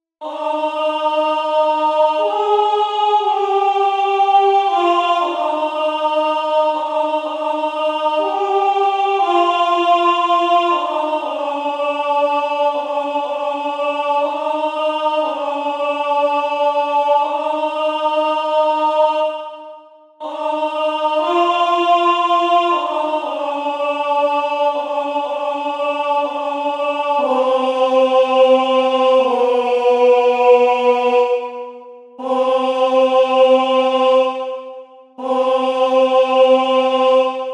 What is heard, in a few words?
alto